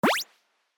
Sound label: jump